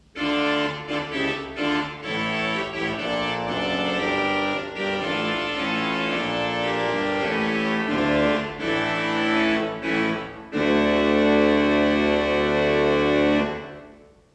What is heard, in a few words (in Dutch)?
fagot 16 voet in combinatie, orgel; assen